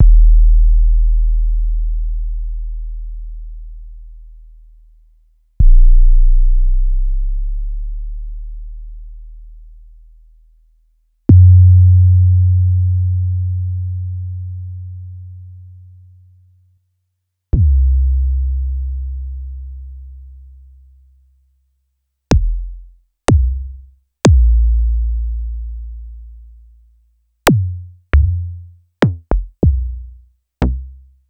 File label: Dave Smith Instruments Tempest